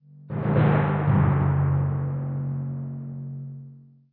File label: Tymp Hits; Lichdom: Battlemage